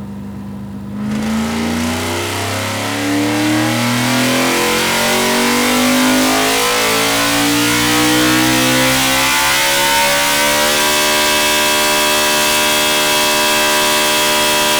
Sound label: ENGINE 9000 RPM; ROUSH